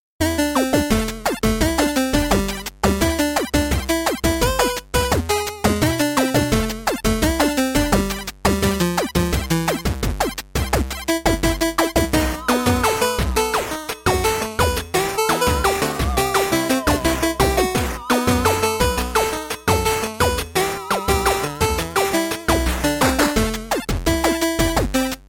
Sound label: Chiptune